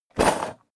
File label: toolbox handling rattle